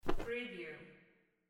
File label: match wave out